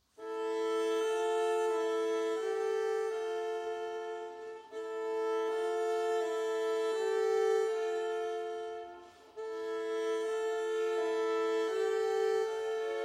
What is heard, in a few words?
a tale for two violins, misty morning lament